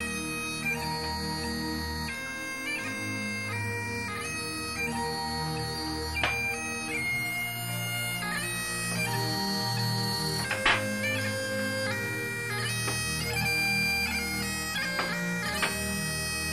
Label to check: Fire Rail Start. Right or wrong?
Wrong